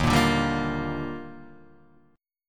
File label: Eb Major 9th, Guitar Half Step Down